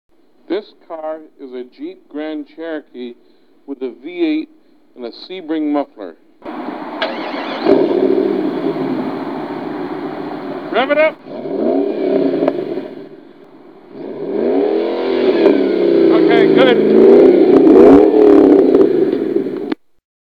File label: jeep v8